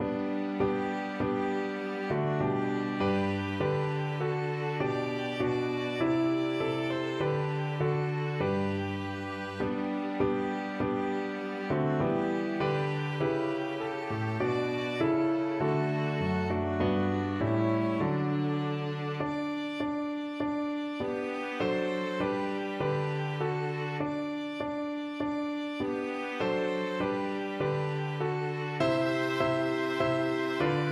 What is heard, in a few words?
hark the herald, piano quartet